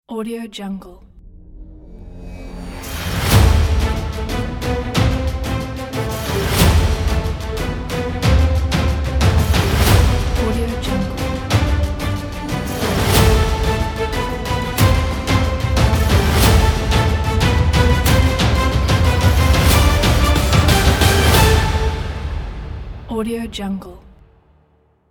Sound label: epic trailer intro ident